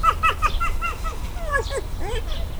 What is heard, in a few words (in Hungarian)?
nyüszögés, aranysakál; szegedi zoo 2011